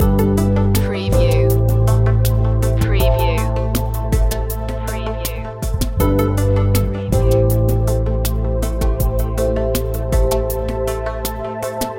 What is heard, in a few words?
chill out love loop